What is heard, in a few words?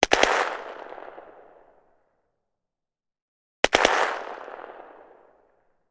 gun carl gustav m45 swedish k; still north media